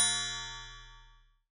synth

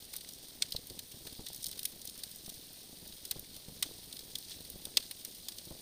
fire

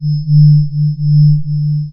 Rotate

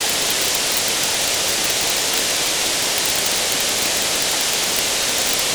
rain synthetic

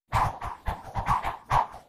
stick synth

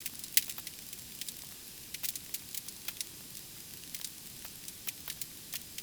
fire synthetic

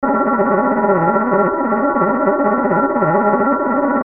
Surface Roughness

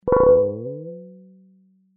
Bounce Grand

